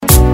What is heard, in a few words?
tamil tone